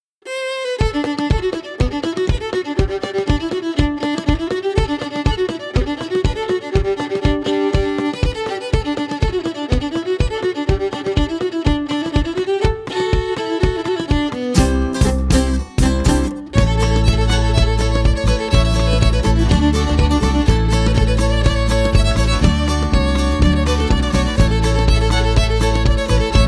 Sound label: instrumental